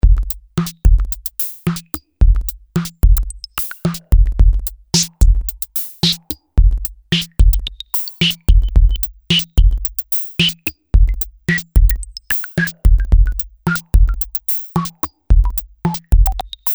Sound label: EG follower, korg ms20 synth